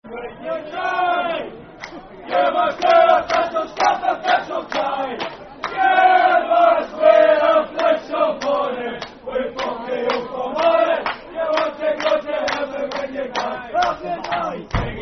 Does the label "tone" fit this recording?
No